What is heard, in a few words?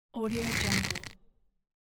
clenching fist